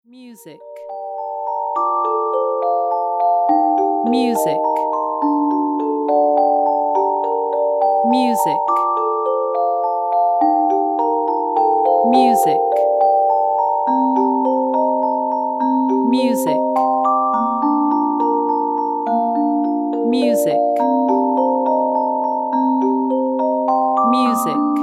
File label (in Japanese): 探索ゲームBGM